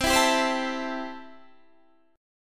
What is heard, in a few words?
C 6th C, chord